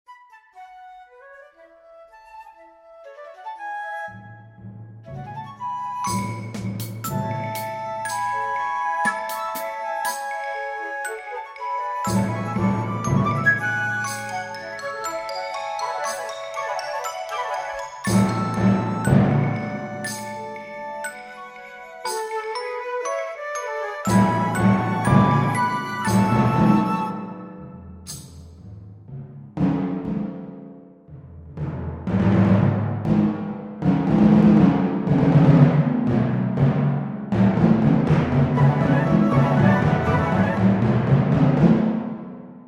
storm warning

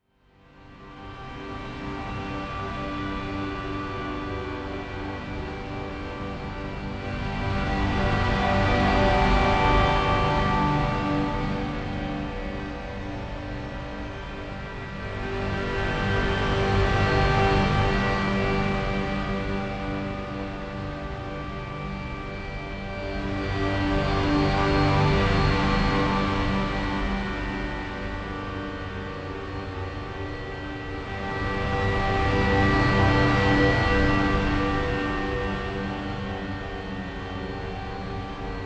organ ocean

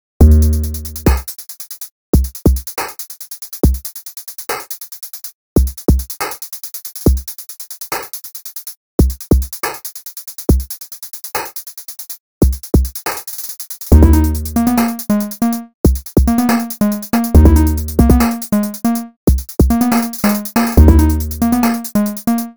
chip drill; weeklybeats 2026

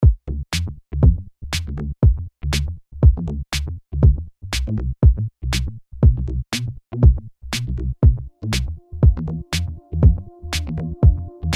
funk forest

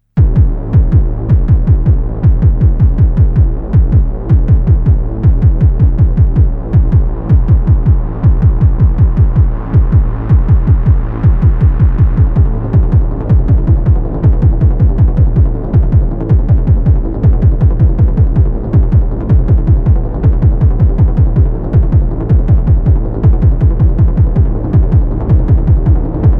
neutron star ambience

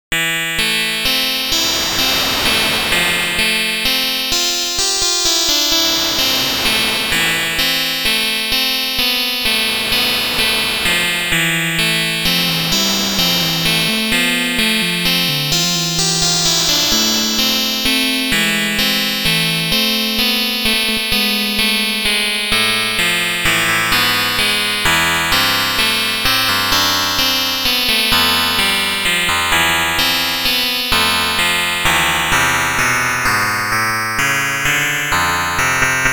virtual boy waltz vb; weeklybeats 2024

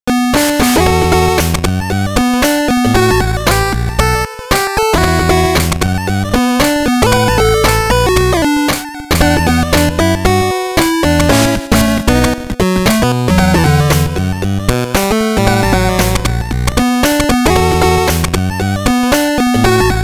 jingle; weekly beats 2020

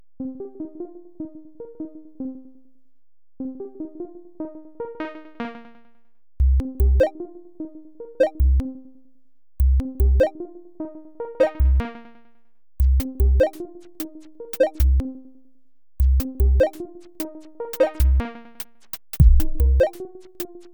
fake filters klystrumpets; suddenly; weeklybeats-2014

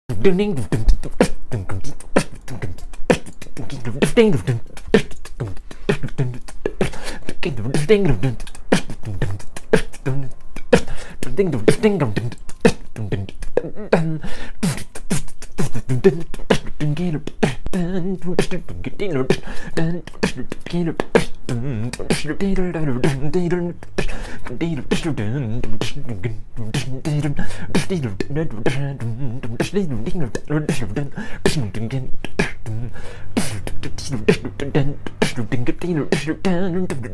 weekly beatbox a rather fun thing; weeklybeats 2026

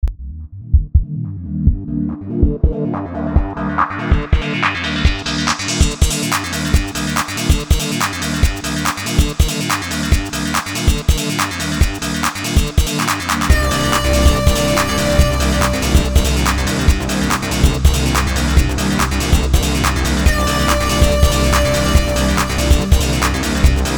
declines drops webbing waves

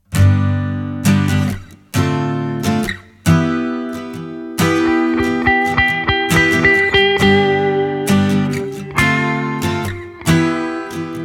autojam in G; weeklybeats 2026

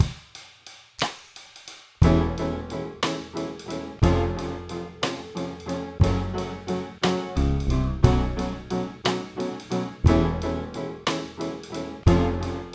piano; weeklybeats 2026

